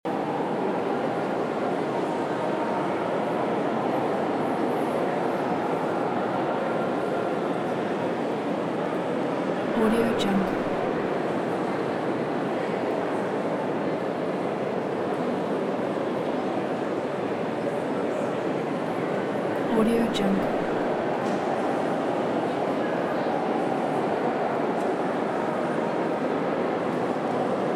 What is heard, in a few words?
ambiance museum interior turkey istanbul ayasophia loop